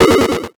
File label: Flash Digital; Multimedia Internet CD-Rom Flash